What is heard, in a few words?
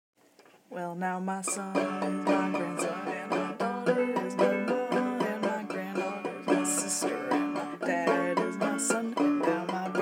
banjo splay song